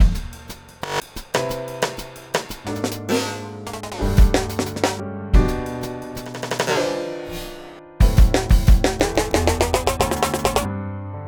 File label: beat